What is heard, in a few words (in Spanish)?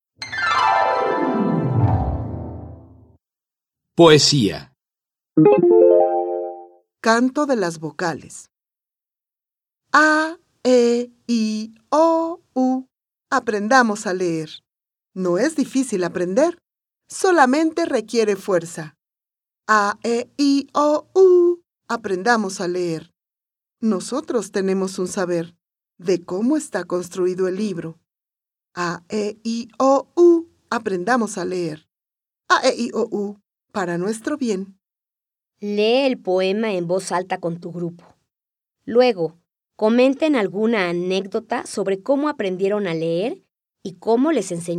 Canto de las vocales